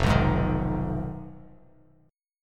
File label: F Minor 7th Flat 5th F down Bass Standard; Fm7b5 strummed; JGuitar